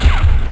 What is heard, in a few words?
fire sil, beretta; weapons